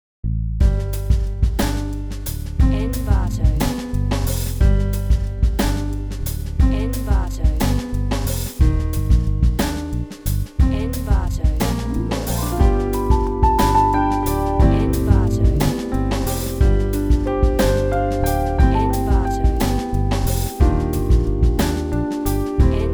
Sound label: sunday jazz band